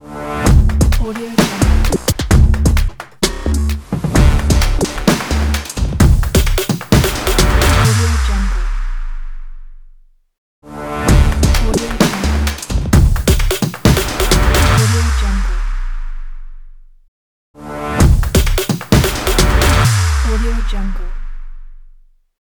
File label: tech dubstep modern logo